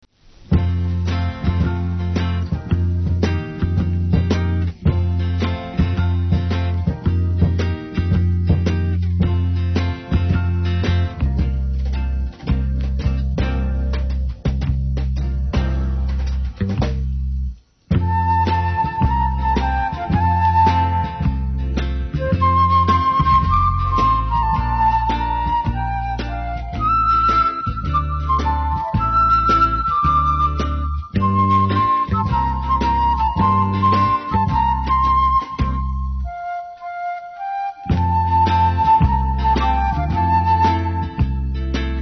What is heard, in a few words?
flute; 東芝音楽工業